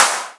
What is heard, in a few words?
snap